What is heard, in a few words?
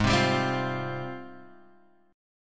G sharp Major 13th, Guitar, Drop D; 6,3,5,5,6,x